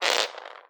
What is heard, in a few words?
Roll On Floor, Medium Wine Glass